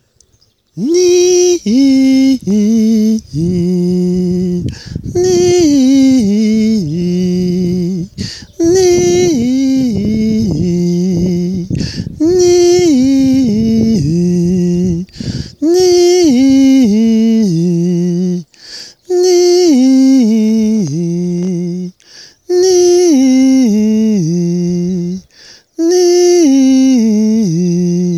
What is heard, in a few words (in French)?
mantra